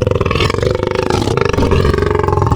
Puma; Cymatics; Serum; Animals for Serum - Gold Edition

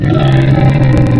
Boar, Wavetables; Cymatics; Serum; Animals for Serum - Gold Edition

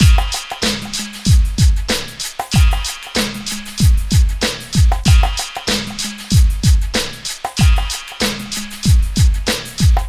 Beat 095, Hip Hop

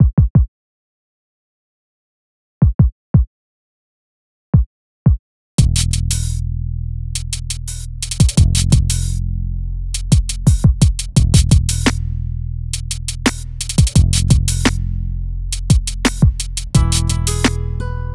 086 Emin, Hip Hop Exotica, Apple Loops; Big Fish Audio

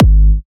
EDM Future Kick

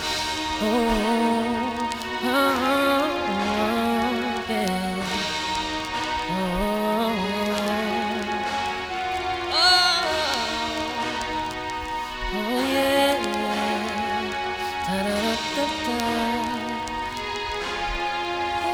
Full with vox, bpm95; hiphop; StudioLinkedVST Vinyl Touch; Progression 12

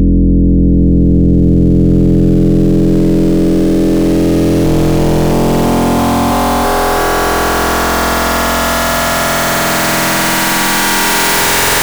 Smooth Grit, wavetables; Cymatics; Nightmares Vol 2 Wavetables; Serum

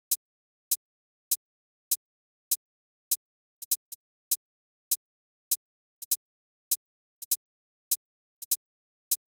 Hat, Drum Tracks; 100 Ebmin; Hip Hop Exotica; Big Fish Audio

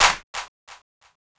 Snare Hit; big fish audio; Hip Hop Exotica; Cmin

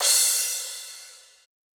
EDM Future Crash, Cymbals; Big EDM; Future House Tools

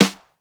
Snare Hit; big fish audio; Hip Hop Exotica

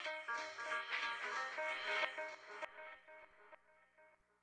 Stab; Thirty-One Flavors

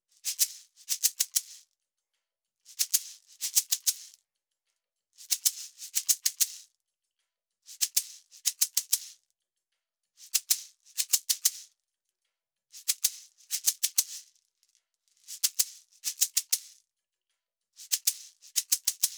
shaker 095; Hip Hop India Modern Percussion; 095bpm

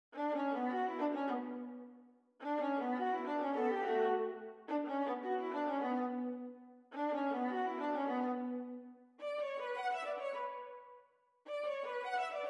106 BPM B Min Violin; Cymatics; Destiny Gold Expansion; Exotic

